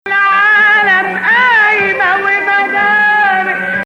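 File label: Hijazkar Octav, Arabic music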